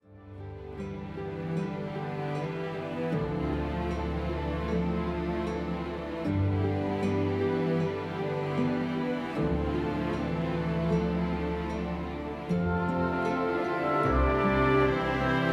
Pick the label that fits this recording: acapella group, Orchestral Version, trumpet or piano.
Orchestral Version